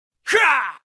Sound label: attk